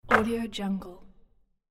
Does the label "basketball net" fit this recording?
Yes